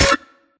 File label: Screen Glitch Short; Boomtown; Titanfall 2